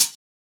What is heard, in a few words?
Closed Hihat; Cymatics; Humble Hip Hop Sample Pack; Cymbals